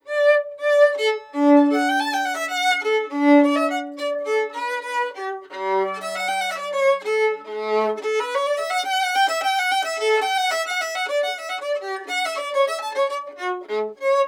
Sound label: Bach Modern Bow Modern